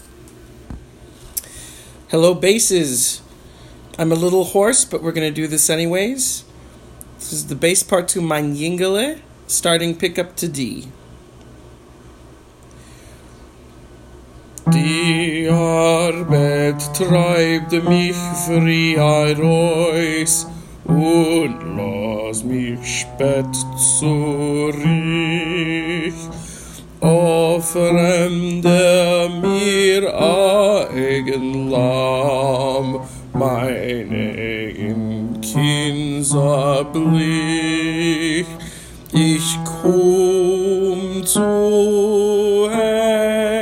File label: Bass; Mayn Yingele